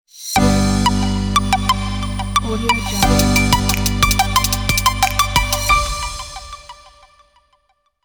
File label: calm simple logo